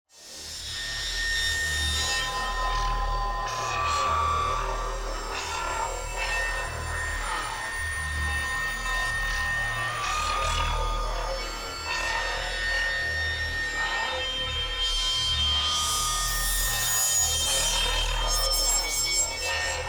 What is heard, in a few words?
NEON AMBIENCE HIGH Shimmering Space; boom library